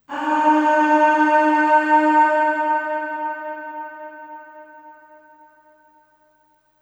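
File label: Aah Short D#3 Long Reverb, Classical Choir; Harmonic Building Blocks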